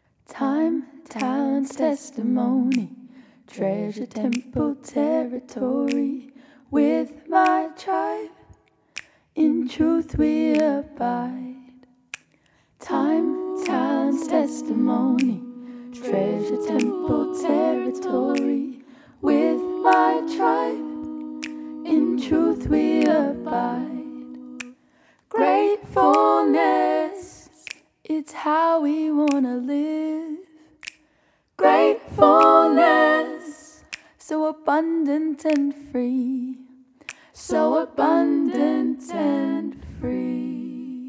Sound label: Jingle